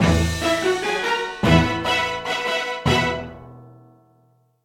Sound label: Jingle Reward; beware planet earth gamerip